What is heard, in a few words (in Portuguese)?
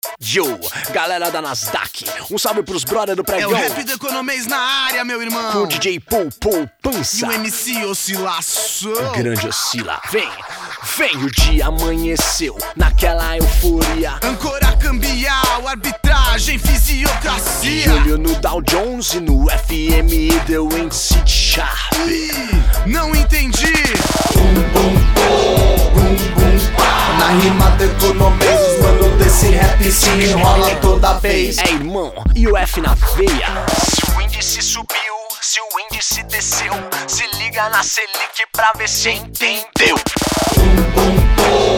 RAP SPOT; ITAU; RAP DO ECONOMES